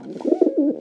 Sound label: pigeon idle, creatures; Counter Strike Model Pack; CS:GO